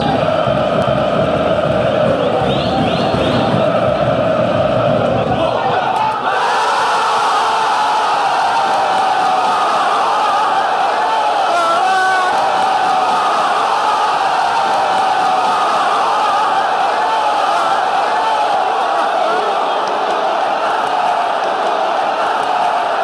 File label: goal, fanchants